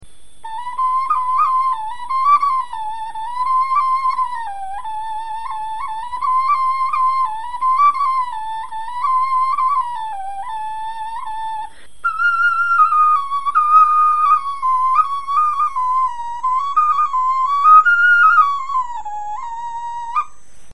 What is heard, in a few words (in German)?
melodie, knochenfloeten